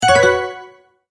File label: Multimedia Tone-Return/Back